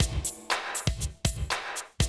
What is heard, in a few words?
120, Classic Drum Loops